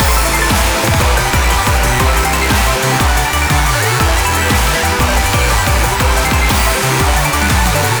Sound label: big Win loop; netent